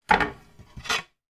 household glass chopping board pick up from worktop